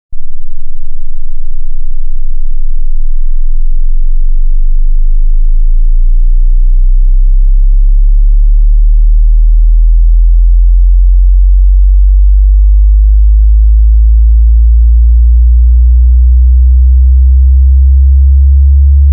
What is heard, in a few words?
10 Hz 200 Hz; bass